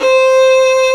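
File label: Vio legato C4; Digital Sound Factory Virtuoso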